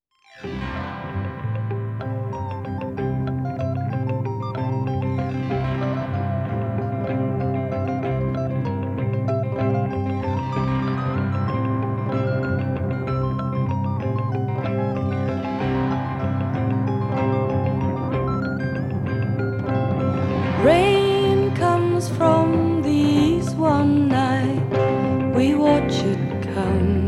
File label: solo vocal version